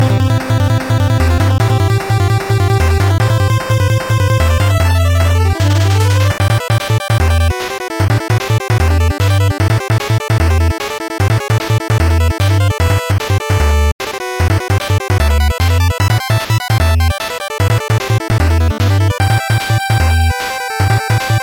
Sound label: battle; rockman7